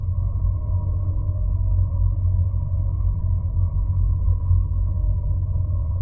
Global Ambient; Tyrannar

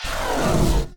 Base Misc Barrier Down

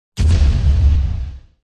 Explosion Ship Small

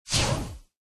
Ship Weapon Railgun

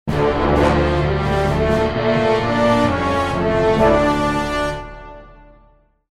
MUSIC Defeat

